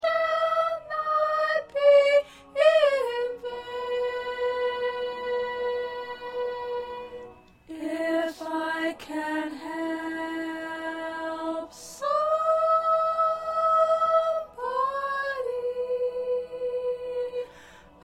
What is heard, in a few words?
2nd Soprano